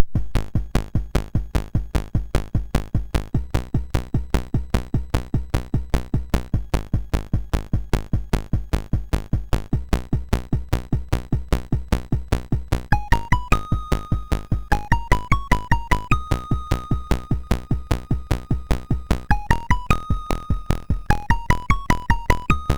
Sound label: sid, MOS6581R2; Rocket Smash